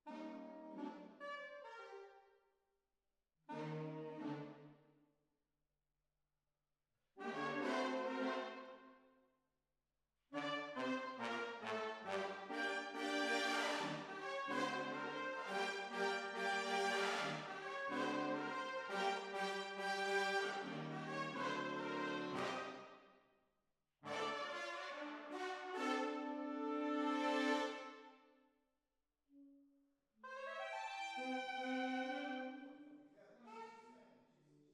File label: Hall